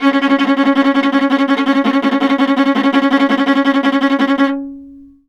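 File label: viola tremolo C#4; Orchestral; DirectWave; Varazdin Orchestral; Viola II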